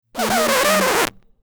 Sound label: record scratch long